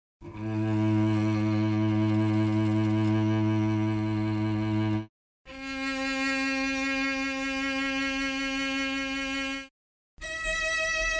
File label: cello